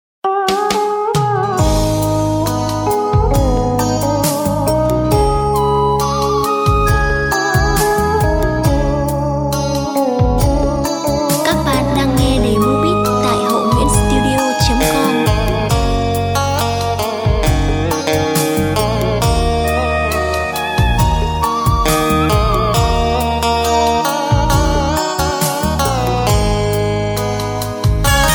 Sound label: beat, playback